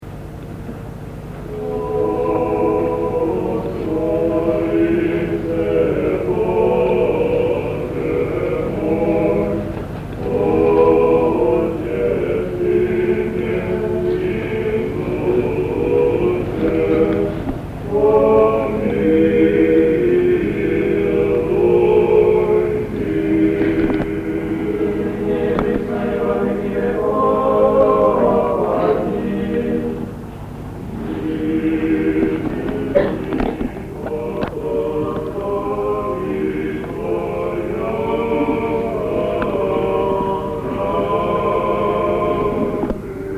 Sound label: Lamentations Canon